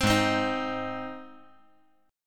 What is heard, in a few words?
Ab Major Ab; strummed; 5,8,8,6; JGuitar